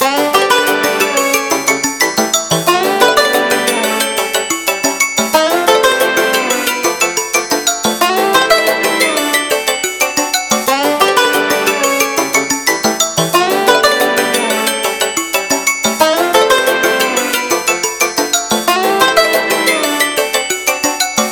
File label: Banjo ring